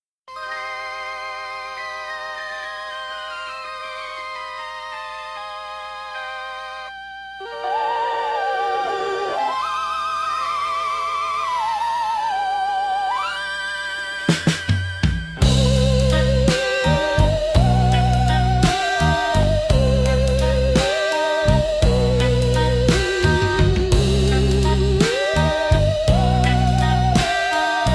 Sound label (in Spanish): música fondo